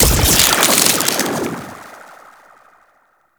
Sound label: Aura Ice Impact; AI Leaders